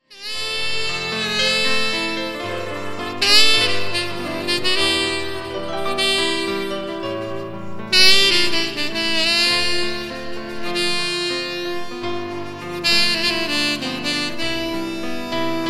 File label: SAX